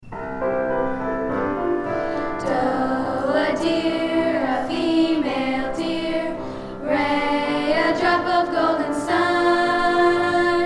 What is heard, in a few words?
do re mi